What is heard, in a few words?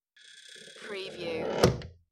Wooden Door Squeak And Close